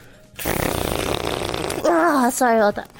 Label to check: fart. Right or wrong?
Right